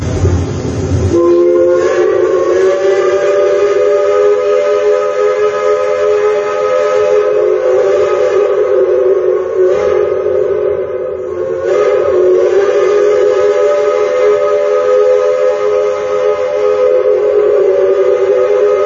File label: horn; Steam Whistles; hobos